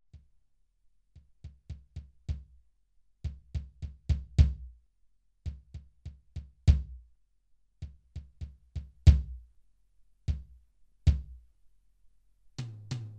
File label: Mountain Music Electric Drums